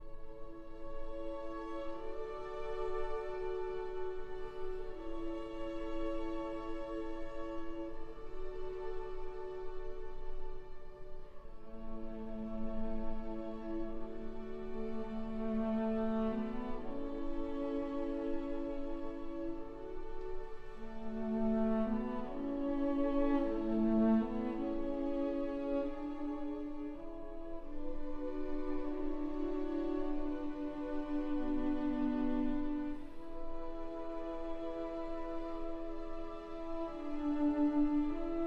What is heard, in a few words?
for cello and strings